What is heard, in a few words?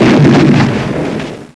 bomb